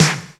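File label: SP-12, drum machine; Best Service Gigapack 2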